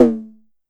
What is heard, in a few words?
Drum X Tom; Pearl Drum-X